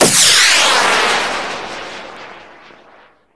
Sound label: MagicItem Rocket Start